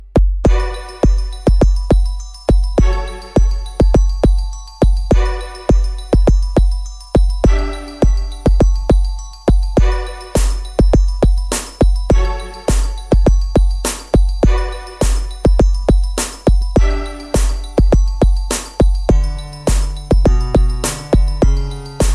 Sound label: New Beat